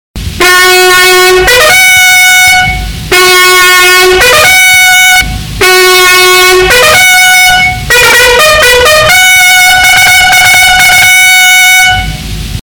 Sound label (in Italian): onori, squilli di tromba in caserma